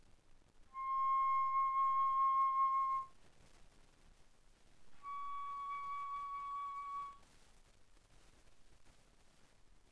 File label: Bass Flute C6 Db6; ff